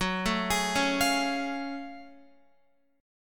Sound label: F sharp Minor Major 9th F sharp Guitar; arpeggiated